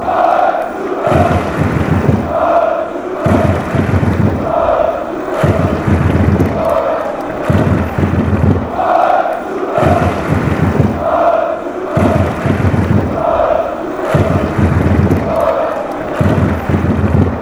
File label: fan chants; vfb stuttgart